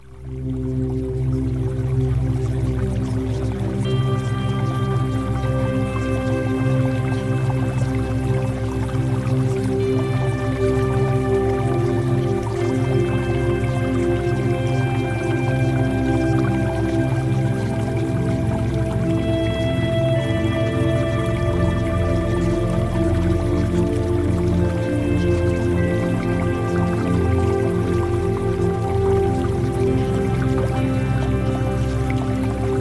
Music Theta Waves

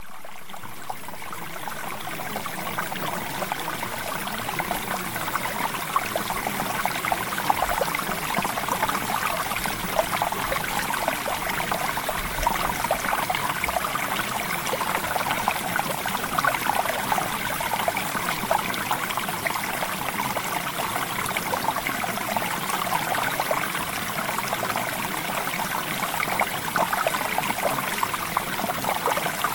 Water; Premium Subliminals

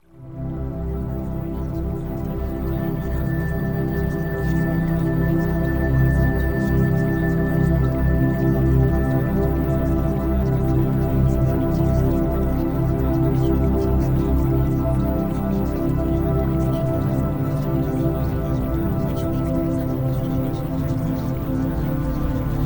Music

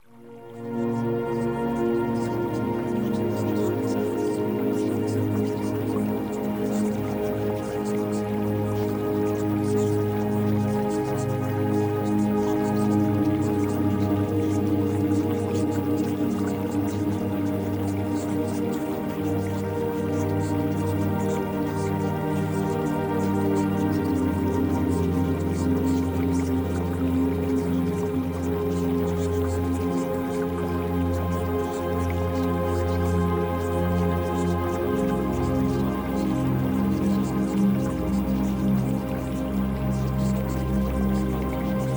Music

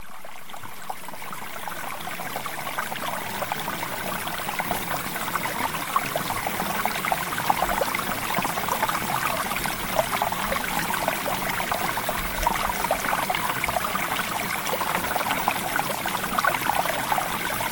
Water; Premium Subliminals

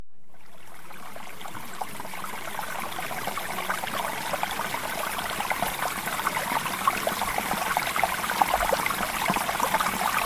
Water

